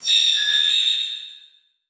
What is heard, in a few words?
Transition Metallic Warble; Production Element